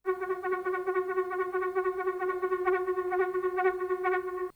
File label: conch; cdp examples